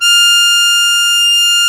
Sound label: musette F4; Historical Instruments; AKAI; USB Soundscan vol.23